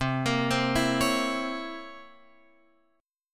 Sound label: C 7th Flat 9th C Guitar Open F; C7b9 arpeggiated; JGuitar